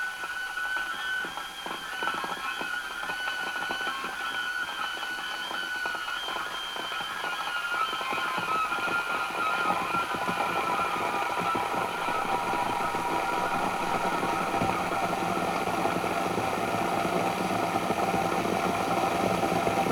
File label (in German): Wasserkocher